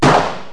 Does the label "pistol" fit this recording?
Yes